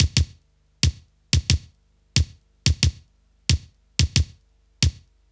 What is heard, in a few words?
Kick Loop, Drum & Bass